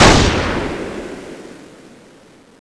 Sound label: scar fire; cso; scar oz